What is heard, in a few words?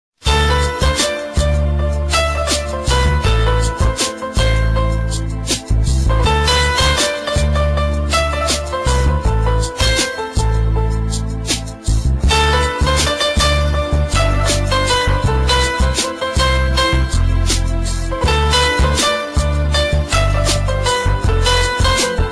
Romantic Tone